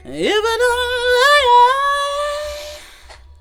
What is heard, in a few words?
FEMALE VOX, Hip Hop